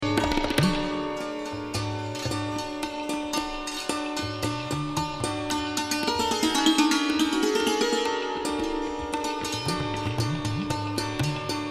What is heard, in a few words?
Santur